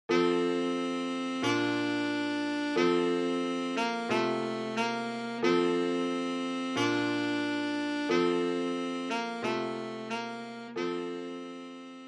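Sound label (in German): O du froehliche, Altsaxophon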